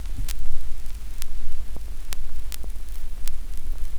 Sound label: SCRCH NOZ, Record Noise